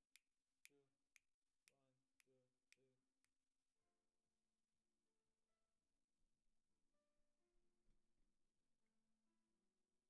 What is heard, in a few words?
SNR